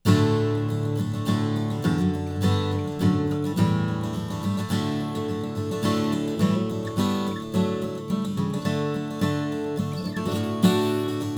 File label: Model J Brazilian DADGAD; Jim Wood